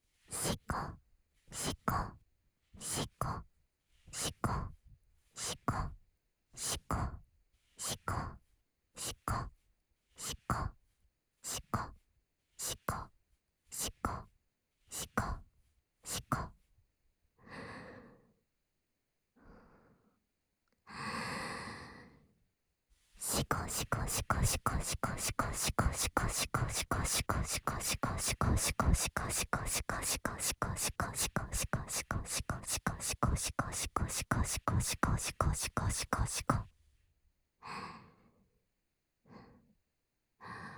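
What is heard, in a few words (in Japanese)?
手コキ（しこしこ・左）手交; SEあり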